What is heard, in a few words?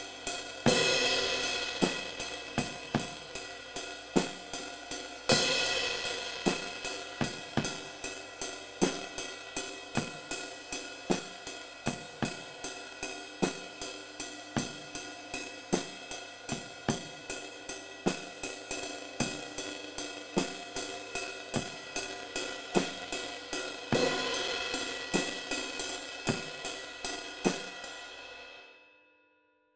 ride